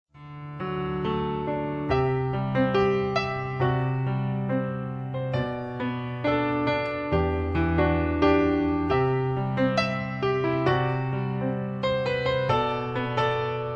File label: acoustic piano